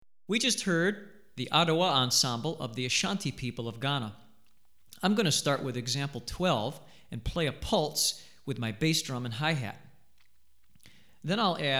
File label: Adowa, Drumset Example, West African Rhythms For Drumset; Hartigan